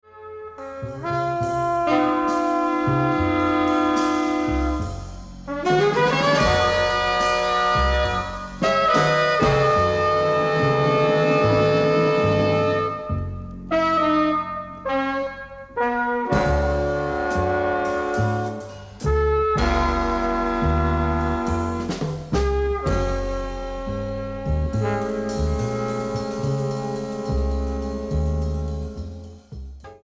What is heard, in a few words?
Suspense